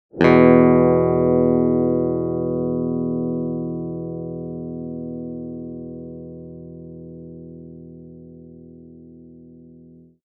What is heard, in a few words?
SYNTHETIC E5 chord no distortion, electric guitar; electric guitar synthesis